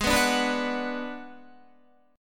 Ab Minor 7th Flat 5th Ab, down, Guitar, 4F, 4A, 5C, 5G, 5C, 6E; strummed; JGuitar